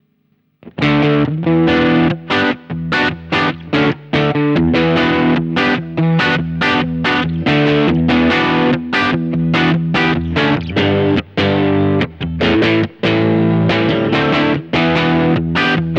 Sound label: Crunch